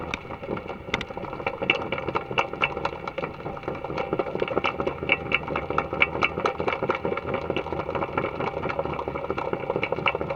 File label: Sailing